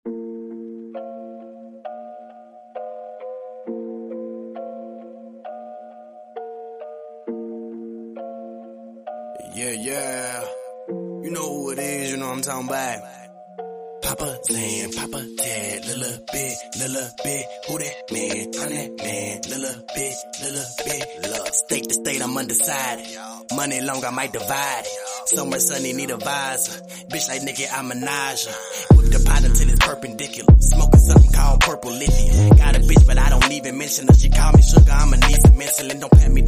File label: Remake